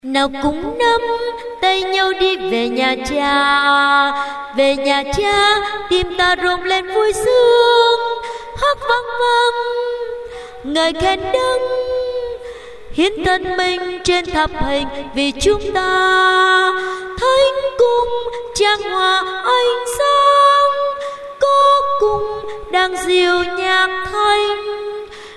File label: sop alto